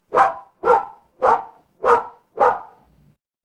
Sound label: animal dog bark